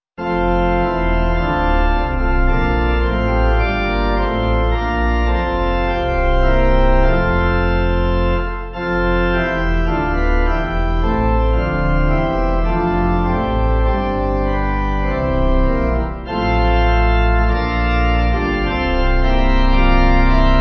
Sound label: Pipe